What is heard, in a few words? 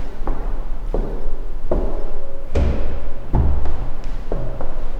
quiet museum ambience; display